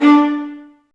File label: STR, STRINGS; Roland - LA Composer vol. 1